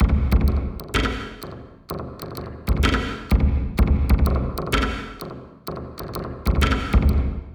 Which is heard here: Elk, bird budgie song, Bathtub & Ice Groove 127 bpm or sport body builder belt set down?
Bathtub & Ice Groove 127 bpm